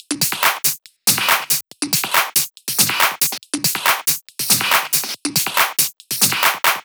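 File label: drum Loop 140BPM Full hi-hat Loop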